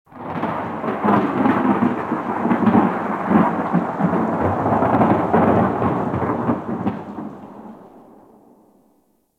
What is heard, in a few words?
Exterior Thunder Claps; Warframe; Ambience; Rail Jack